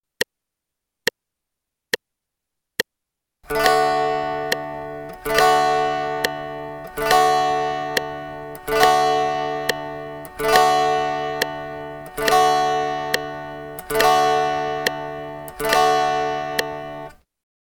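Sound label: strum quarter notes pattern 2 D, guitar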